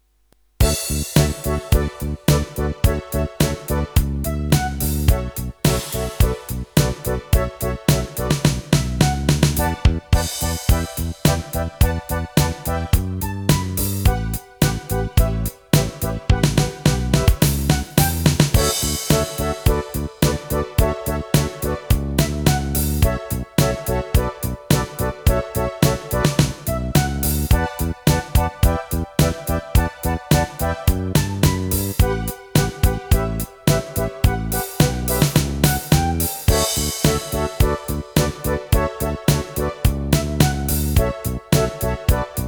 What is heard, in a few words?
Yamaha tyros